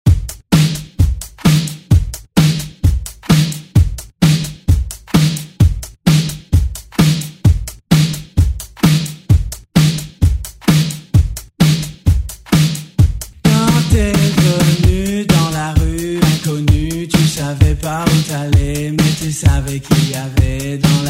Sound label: oldschool; Deejay Hit; Hit Oldschool Redrum